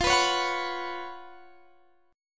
B Suspended 2nd F; Bsus2/F; strummed

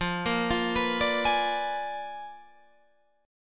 A sharp 7th Flat 9th F

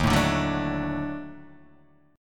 Eb Minor Major 7th Flat 5th, Guitar Open D

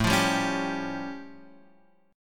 A Augmented 7th A Guitar Drop D

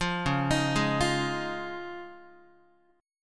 Db Major Add 9th, Guitar; arpeggiated; JGuitar

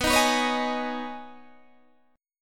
B 6th Add 9th B Guitar DADGAD, chord

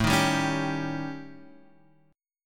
A 7th Flat 5th Guitar Drop D; x,0,1,0,2,3

